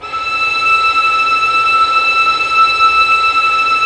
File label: STR VLS, STRINGS; Roland - LA Composer vol. 1